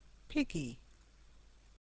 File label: piggy